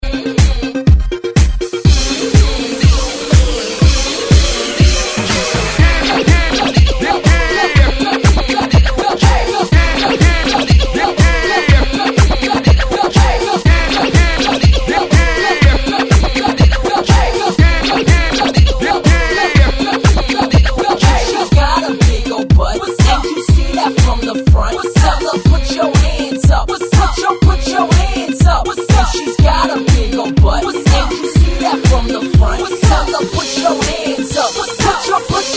Hype ReDrum 122 Bpm; DJDOLLS

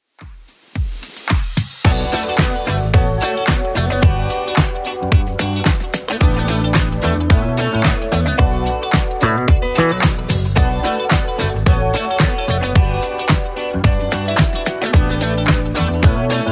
80s Club Edit 110 bpm

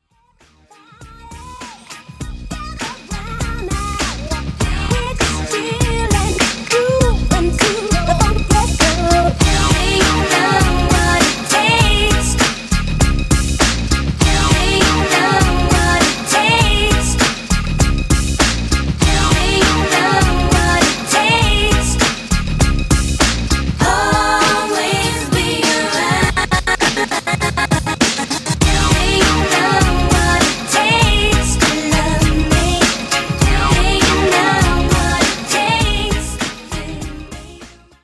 90s Re Drum 100BPM

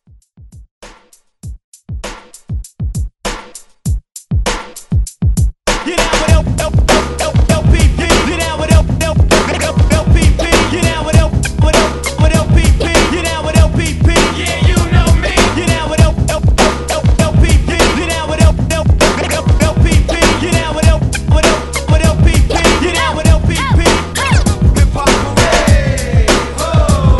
Old School Hype Redrum

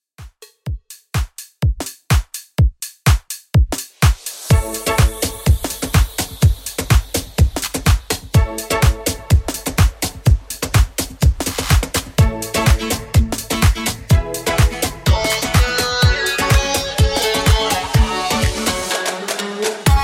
Extended Dance 125Bpm

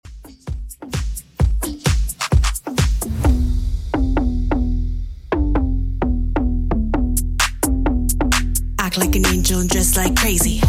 Top40 Redrum 130bpm

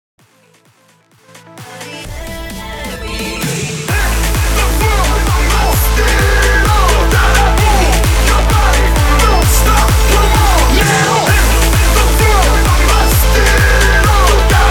BIG ROOM 130 BPM

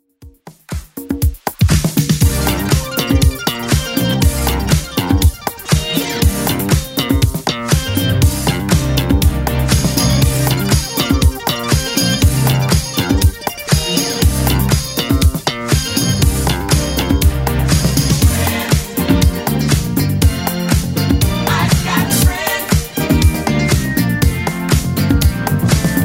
80s Club 120 bpm CLEAN

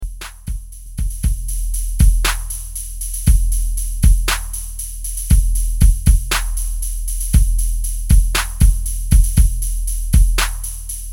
Hype 118 BPM; REDRUM

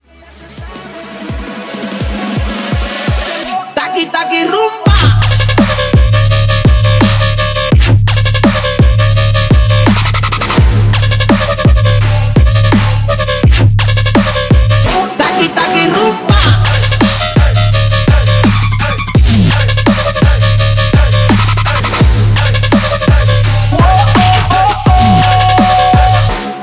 Dubstep Trap

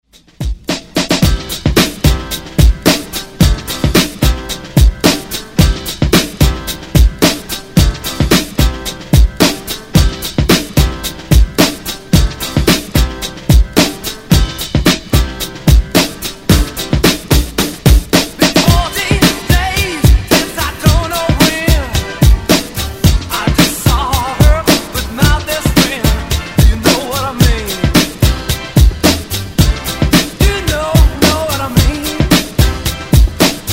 70s Ext Redrum

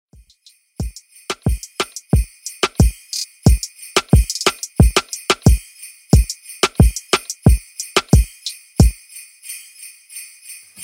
Christmas Dance Hall 90 Bpm

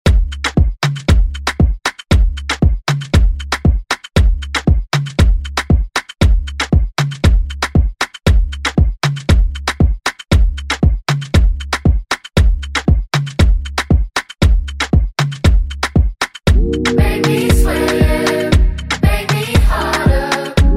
Pop Redrum snippets; Deejay Hit

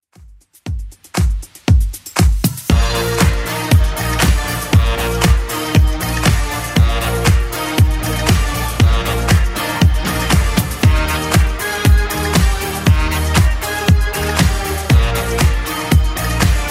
70s Club Edit 118 bpm

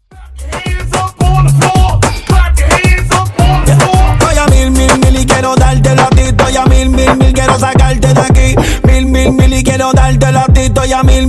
Hype Dj Intro Outro Dirty 110 Bpm

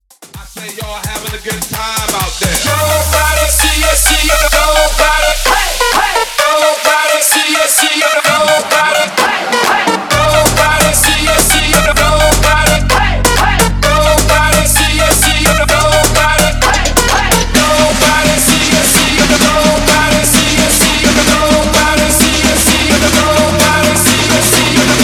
Bootleg Mixshow 129 BPM

